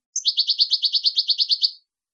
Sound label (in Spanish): padreos corridos; campero